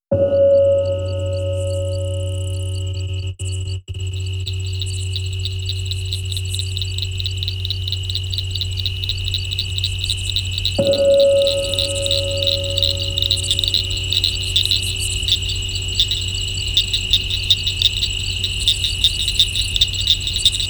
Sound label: Night Forest